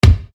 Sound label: Bass Dr, EuroDance Bdrums